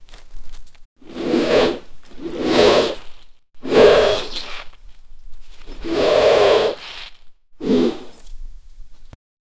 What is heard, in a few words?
t rex guttural roar snaps, dinosaur